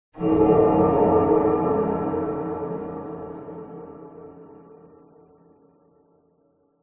cinematic hit dark evil suspense; sound design; zapsplat

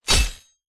impact metal sword hit wood large

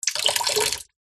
nature water pour slight trickle short

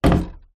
household door internal bathroom close

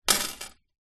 chain small decorative down on glass table; zapsplat

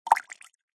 nature water short sudden drips

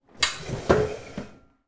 household chair slide pull out hard floor

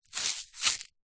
salt rock in plastic shaker tip movement

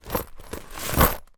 foley coins inside small backpack movement

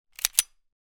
gun 1911; weapon; still north media

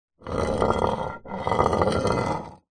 industrial hammer drag scrape on hard surface; zapsplat

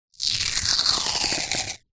band aid plaster strip peel from roll

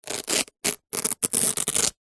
rollerblade boot velcro rip; foley

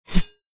sword swing medium whoosh blade ring; zapsplat

